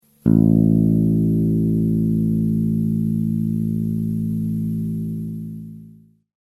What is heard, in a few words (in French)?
basse a, accordeur